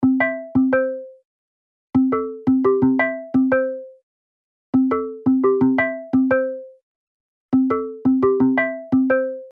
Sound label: summer bottles